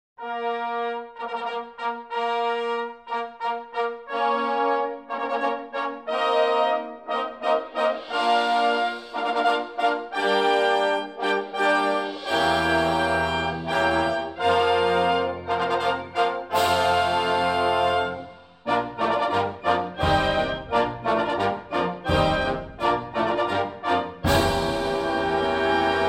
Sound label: jubilant fanfare